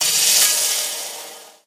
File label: lightbridge elec, footsteps